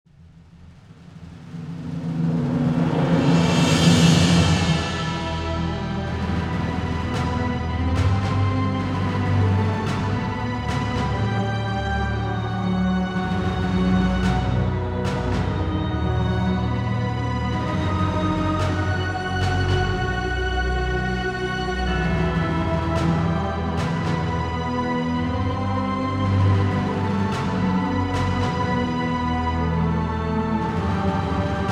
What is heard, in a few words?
Defeat; Guns of Icarus Online